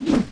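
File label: weapon axe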